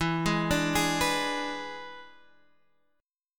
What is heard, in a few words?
E 7th E down Guitar Standard; E7 arpeggiated; JGuitar